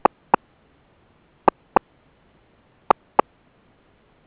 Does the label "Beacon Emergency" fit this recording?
Yes